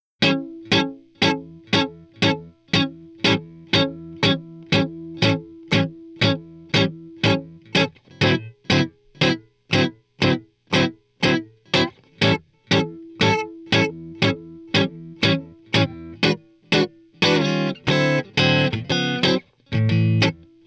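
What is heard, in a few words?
Blues Guitar; Pro Tools Essential Loops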